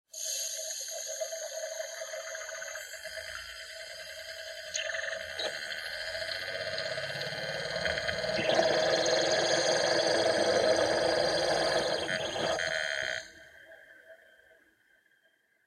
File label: Portable CD Driving Noise Loading